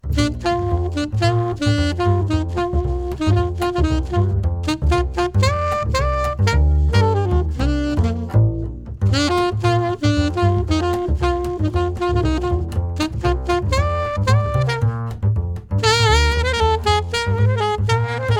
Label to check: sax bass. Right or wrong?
Right